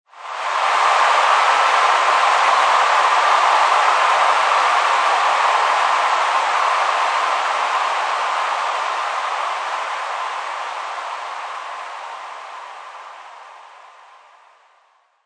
Sports Stadium Crowd Cheer Touchdown Or Goal Scored; Crowds; Lynne Music